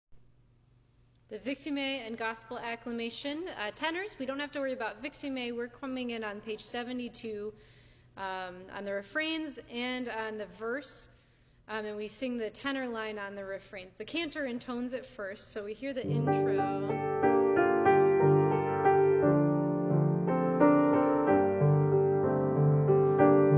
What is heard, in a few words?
Alleluia tenor